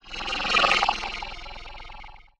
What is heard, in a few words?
Ring Mod, Cymbal, contact mic; Murf & Ring Mod